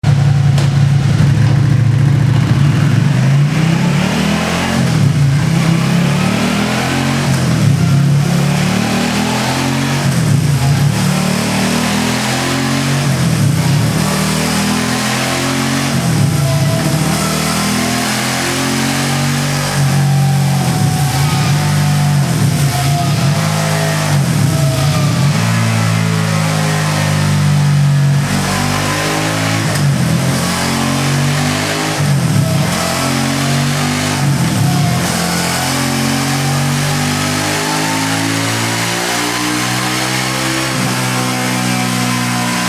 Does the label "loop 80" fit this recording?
No